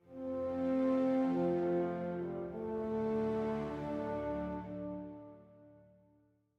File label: Stinger